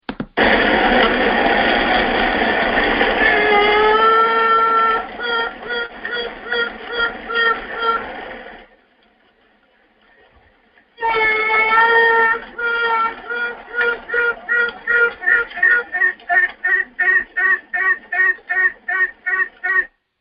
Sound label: bee Buzzing; forestcalls